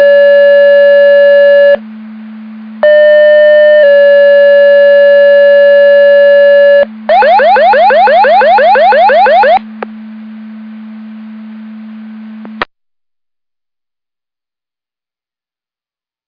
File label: Siren; Andover Twp Boro